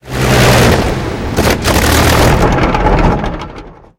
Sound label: engine griffon stop; War Thunder